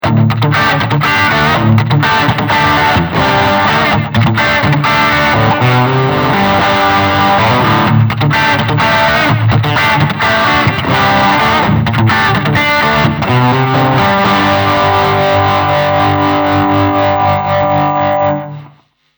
Crunch

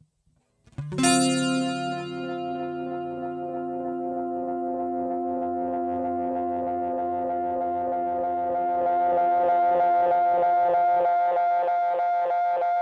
GTR Space Echo FX; UAD Apollo

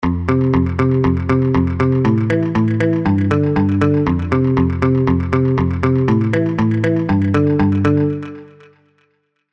Avenger GT Guitar Mute

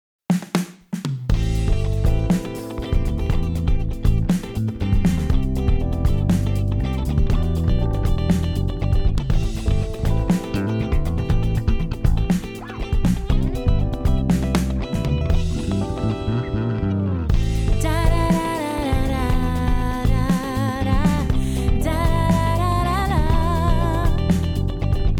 Portico 5043 mix wet comp; Rupert Neve Designs

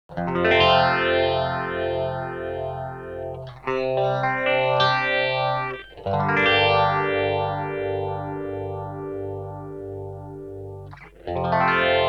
Red Panda Radius Phaser

